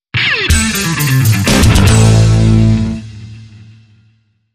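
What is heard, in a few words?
Hard Rock Guitar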